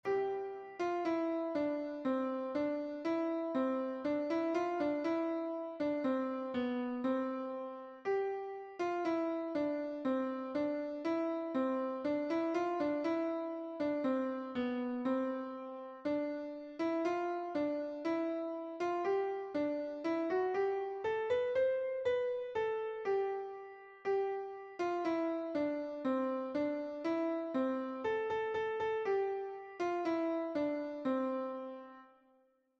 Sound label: Deck the Halls Piano